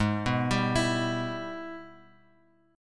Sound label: Minor Major 7th Sharp 5th; G#mM7#5 Chord {4 2 5 X 5 X} arpeggiated